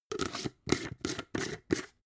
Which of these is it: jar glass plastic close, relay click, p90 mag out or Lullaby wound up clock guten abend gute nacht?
jar glass plastic close